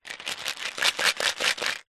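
household vitamin tablets in plastic pot lid on shaking fast; zapsplat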